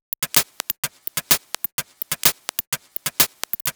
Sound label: Hihat 127bpm, House Drumloops; 90 s Sample CDs; Zero-G House Fabrik Drumloops; ZG Drumloops